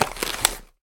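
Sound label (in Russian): треск стекла когда на него наступили ногой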